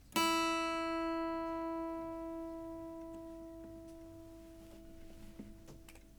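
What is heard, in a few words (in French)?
Electroacoustique mi-, guitare électro acoustique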